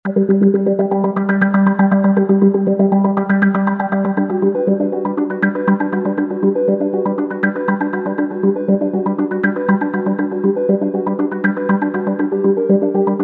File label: Sleep Well, Roland V-Synth